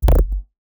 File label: F-D Distorted Bass Tone; neuro; BIG5AUDIO